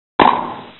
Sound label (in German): tennisball